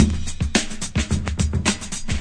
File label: 108 vinyl smooth groove, drum loops; 101 to 110 bpm